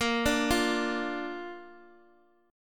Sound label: A sharp Major